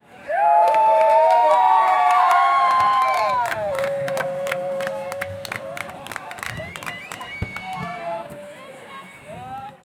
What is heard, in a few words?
background voice, festival